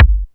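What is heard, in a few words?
bass drum, drumtraks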